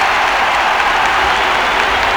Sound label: Crowd; Decors